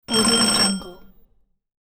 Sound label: doorbell short ring; hollywoodedge